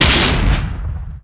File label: HIT MED